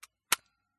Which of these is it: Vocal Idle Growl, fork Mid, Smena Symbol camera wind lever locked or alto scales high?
Smena Symbol camera wind lever locked